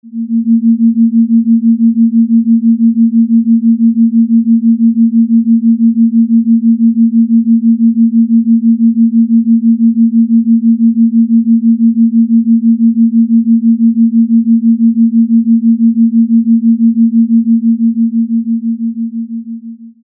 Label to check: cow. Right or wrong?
Wrong